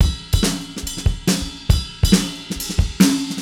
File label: Acoustic Kit, Drums & Percussion